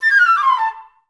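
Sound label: flute run down A3; Best Service; Peter Siedlaczek's Advanced Orchestra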